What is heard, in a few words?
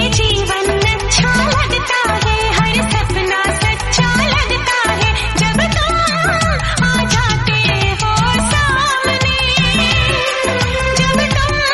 Female Voice